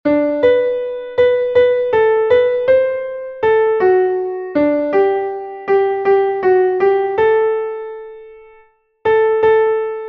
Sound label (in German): klavier; in einem kuehlen grunde; melodiemeister